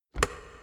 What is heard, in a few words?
tumblers off; p00gie